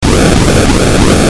retro; maoudamashii

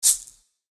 ignition; maoudamashii

